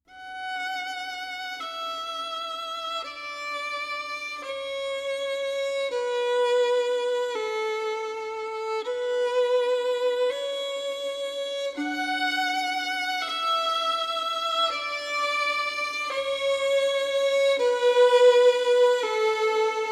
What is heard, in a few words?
2 Violinists; Canon in D